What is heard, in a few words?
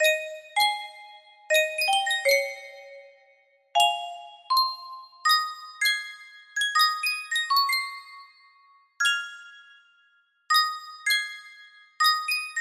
sankyo custom tune music box, music box melody